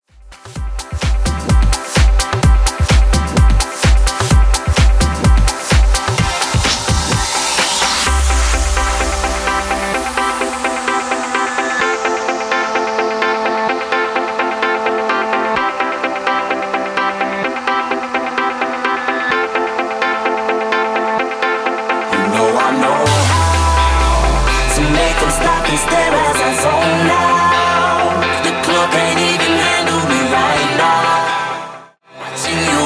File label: Clubbing House Clean 128bpm